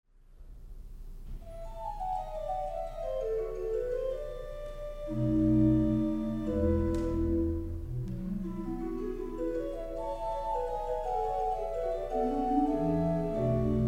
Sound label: organ; Whisper In The Wind; voluntaries